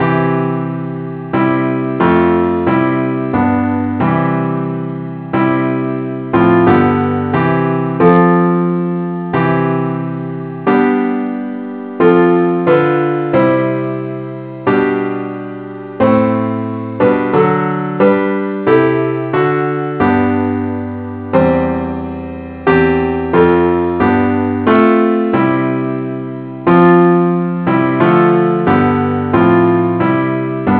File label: parts only, key c; Christe Sanctorum